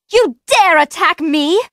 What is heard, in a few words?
female wizard; diablo iii; ryona